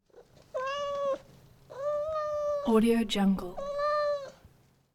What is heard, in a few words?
cat meows